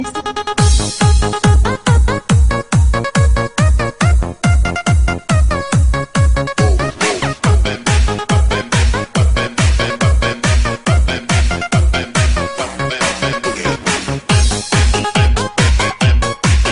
Mix Disco

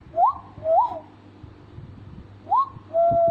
Owl

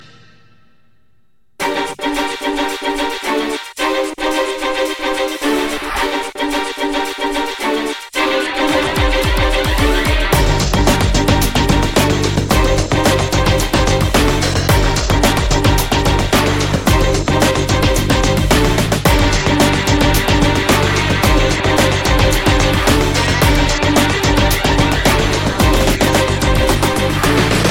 String

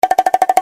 Bongo

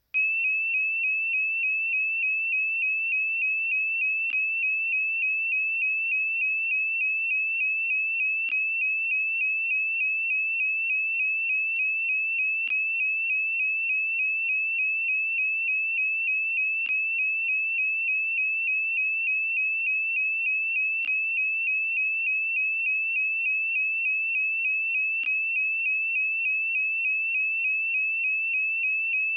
SimpliSafe Alarm

